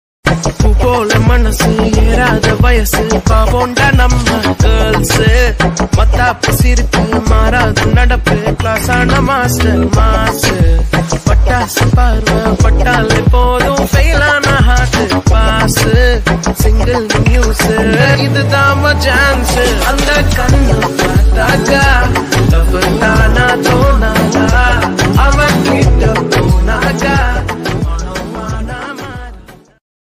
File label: tamil ringtone